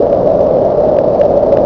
uçak motor; tasitlar

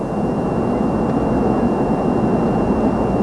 uçak kabin; tasitlar

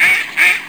ördek vak; hayvanlar

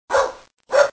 köpek, hayvanlar

